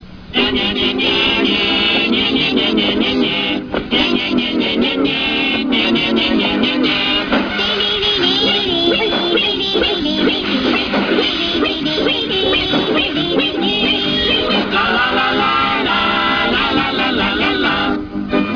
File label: la la la